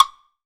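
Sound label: wood block, Drums & Perc; AKAI S6000 CD-ROM - Ultimate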